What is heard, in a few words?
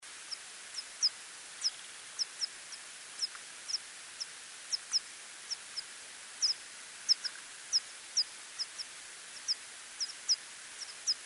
Yuma Myotis multiple; OFarm